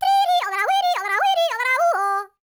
YODEL, World Voices

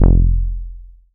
Bass, 2600 F1, Synths, ARP 2600

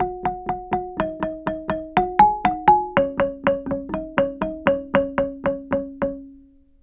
GAMBANG PH; Spectrasonics; Heart of Asia

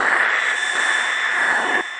Noise Sting; musicradar

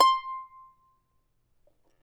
charango C5; Spectrasonics; Hans Zimmer Guitars VOL-2

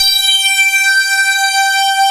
P-5 SYNC G5, Prophet Five; E-MU Formula 4000 Series Vol. 3; Analog Odyssey

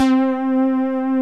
LINEAR SYNTH

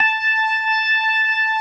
81 STOPS, Synth and Organ; InVision Interactive; Keith Emerson; The Most Dangerous Synth and Organ; ALL STOPS

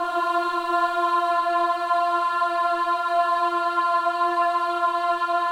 WOMEN AHS F4; Spectrasonics; Symphony of Voices

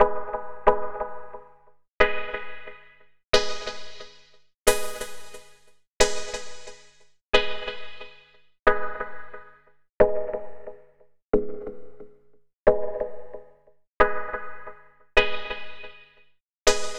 Skank, Synth; Zero-G Smoke

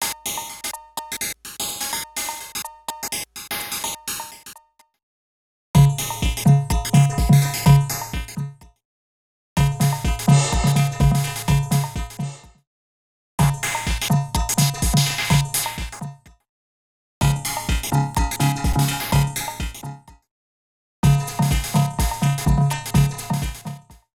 Electro 126bpm; X-Static Goldmine 5

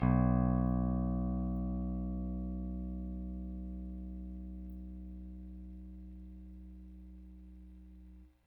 Rickenbacker Bass; Airwindows

